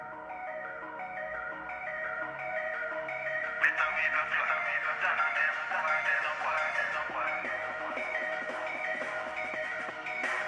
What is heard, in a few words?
zenfone 9 speaker